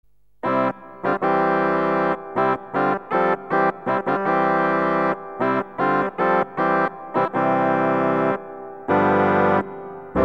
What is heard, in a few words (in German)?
bombarde 16, sakralorgel